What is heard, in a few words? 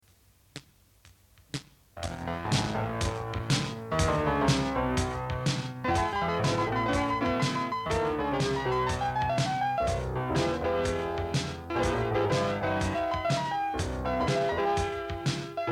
Piano Blues